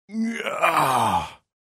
Middle aged male yawn and stretch

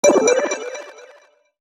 Tech alert tone digital futuristic

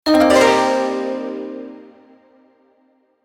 Chinese transition cartoon asian inspired

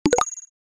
Digital futuristic alert

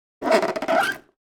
Wood squeaks

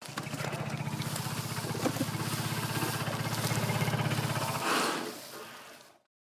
Calm wind through african elephant grass

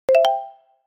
Positive message notification

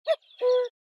Common cuckoo bird call

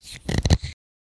Potion bottle cork stopper close

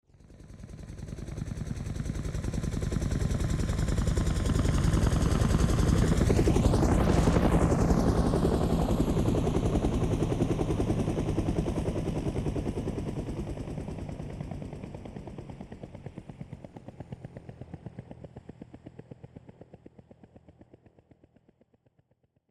Helicopter flying overhead slow pass by

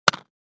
Drop box of chalks on wood; Chalk and Chalkboard

